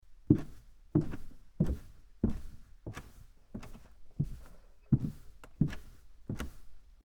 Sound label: Wooden Foosteps; horror; horror sfx vol 2